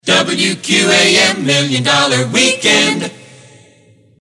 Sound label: Moog; WQAM; Gwinsound